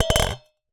Ricochet, vase

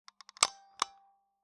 Taxi Meter

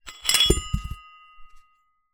Hole Saw Rattle, Hole Saw Bits

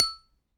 Aluminium Tube Hit

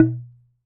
Wooden Bar Strike

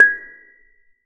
Bonang P, Gamelan

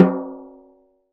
Snare Drum; Percussion Samples

